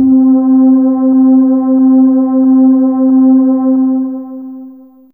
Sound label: Fen String C3, Fairlight CMI IIe Hi String; 12HiString